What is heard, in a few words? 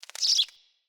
Fey Flock Attack Dive; Powers